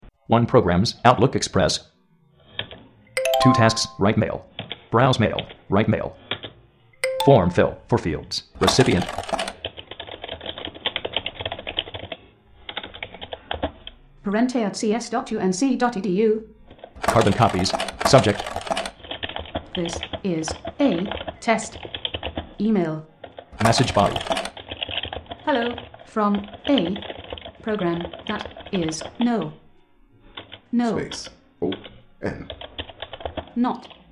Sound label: clique keys